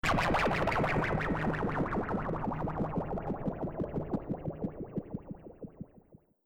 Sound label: Electro Blast, explosion; Battle Sound Effects; Bombastic Booms And Battle Ambience; Andrew Fleming